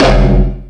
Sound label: Multi FX